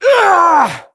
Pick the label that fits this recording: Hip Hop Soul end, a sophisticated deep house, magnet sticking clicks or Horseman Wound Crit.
Horseman Wound Crit